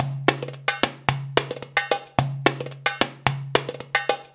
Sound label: PERLP, ArabianTraditions